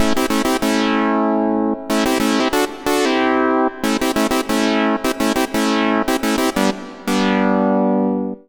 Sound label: ABADDONS WET, Synth and Organ; InVision Interactive; Keith Emerson; ABADDONS BO2